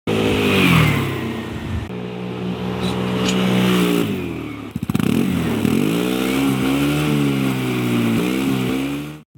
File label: vespa 300 gts